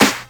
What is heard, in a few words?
SD, dance drums hip